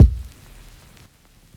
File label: kick; eddyflux; crate bd; dough-samples